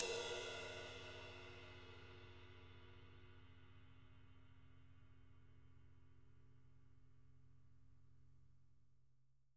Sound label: JZ RIDES, Living Drums